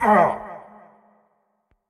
Marv Hurt

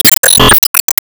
Enemy Fashion 1st Heal

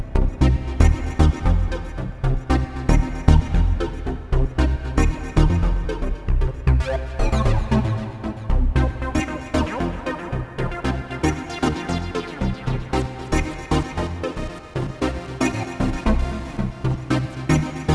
a sprinkle of funk (In-Game); Riddle Transfer

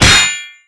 Enemy Metal Damage hit; disney star smash; android

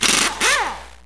Menu; Car Over

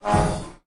Parade March; Lethal Company

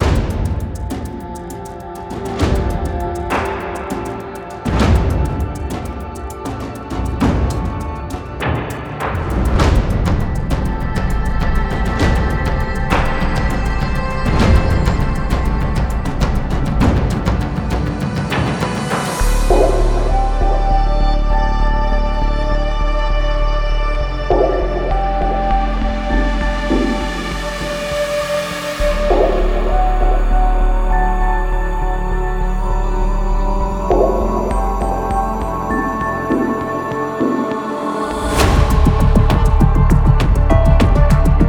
Falling Drums

